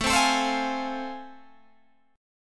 A Minor Major 7th Sharp 5th, Guitar Open G

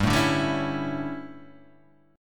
D Major 7th Suspended 2nd Suspended 4th

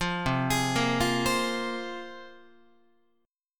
C 7th Suspended 4th Sharp 5th, Guitar; C7sus4#5 arpeggiated

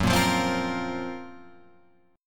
F sharp Major Sharp 11th, Guitar, Open F; strummed; JGuitar

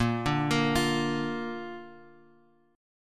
Bb Major, Guitar, Open F; Bb arpeggiated; 10,9,10,12,x,x; JGuitar

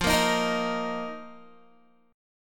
F 7th Suspended 4th F down Guitar; strummed

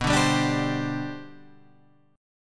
C Major 7th Suspended 2nd B, chord

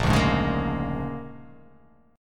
A sharp 7th Sharp 9th

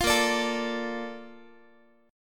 A sharp Major 7th, Guitar; strummed

